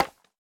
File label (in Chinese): 竹放置声